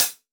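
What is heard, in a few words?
Standard Closed Hi Hat alt, Roland BK-5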